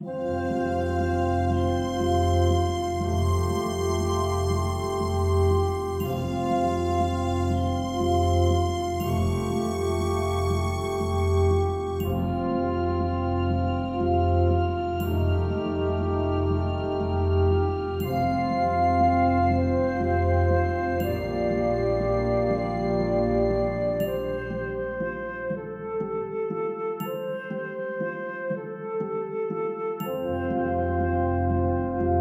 Worldmap Loop; Toki Tori 2

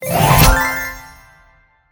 SFX Rainbow Create; Ellen's Garden Restoration; android

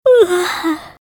FULL YAWN; F.A.M.P.S

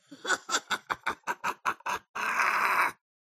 Spring laugh; gamerip; the joy of creation halloween

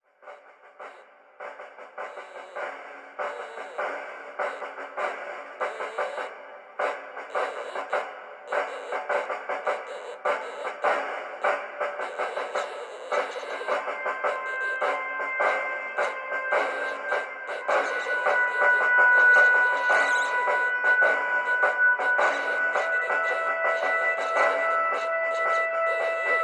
Opening Music

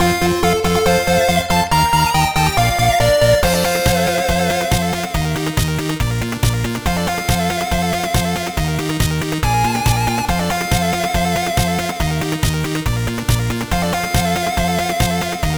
Win Loop; You Must Build a Boat; Android

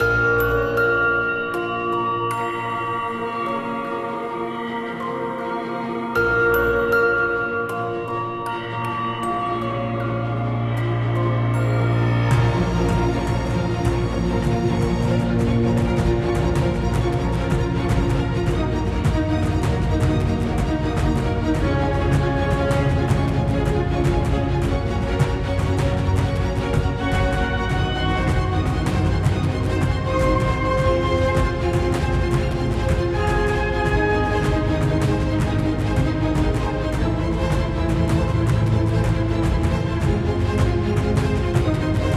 Battle Christmas; Huangye

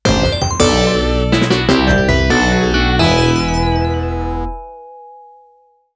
Victory

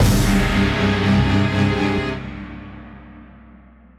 Dramatic Event Bosque

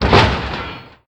Dungeon door close; blastmorph pinball; xbox series xs

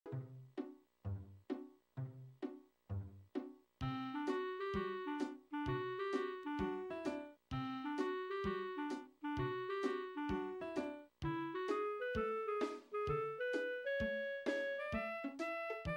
Bonus; Toy Story 2 Operation Rescue Woody

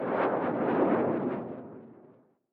Throw Monster Fall

